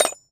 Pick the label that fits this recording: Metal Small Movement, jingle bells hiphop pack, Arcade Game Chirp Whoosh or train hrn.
Metal Small Movement